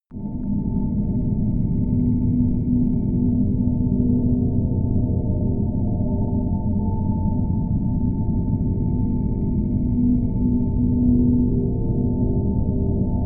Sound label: drone low noise ringing